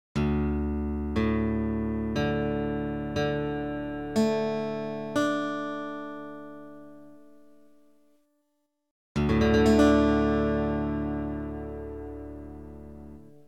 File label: Guitar DADDAD Tuning; List of guitar tunings; Open F